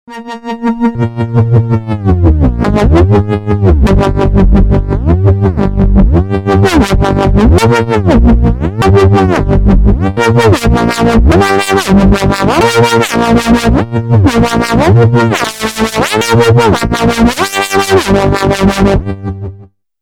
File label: waldorf rocket pulse lfo